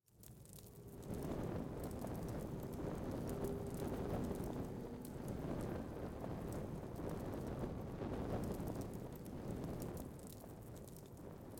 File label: hover